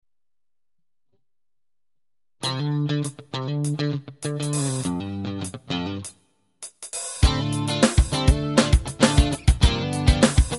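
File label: Dorian D min Backing Track, chitarra elettrica; lezioni chitarra elettrica legati sulle scale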